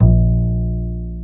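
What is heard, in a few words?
String, contrabass pizzicato; String Master Series; Cb Pizzicato; Cb Pizz 2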